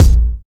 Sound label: rich mid range kick c sharp key